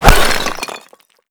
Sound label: impact vase; weapons; Modern Warfare